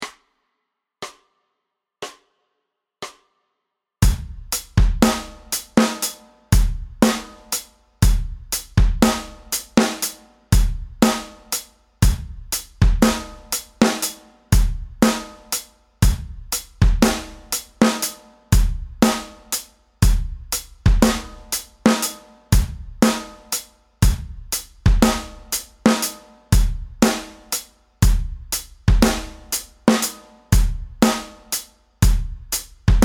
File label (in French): tous les swings